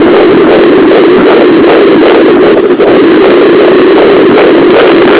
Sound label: heart beat